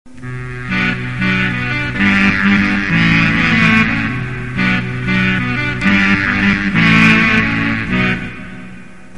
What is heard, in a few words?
ten sax